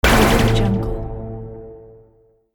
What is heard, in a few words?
laser resonance zap